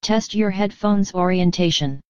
menu; DeadFright

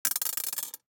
DICE on Glass, Throw and Roll, Mini, 2 Two Dice; Samples; ArticulatedSounds; DICE Sfx Party (Extended)

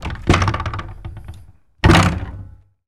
Door Double Wood Open; Doors

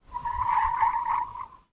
skid asphalt; Blind Drive

